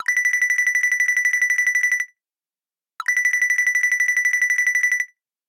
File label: Dots, ringtones; Play It Loud